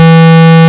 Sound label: OB SQ, Synths; Multisamples; Ableton Live 12 Lite; Core Library